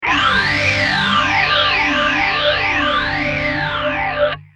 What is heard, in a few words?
gtr